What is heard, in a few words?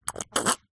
human drink tea coffee sip slurp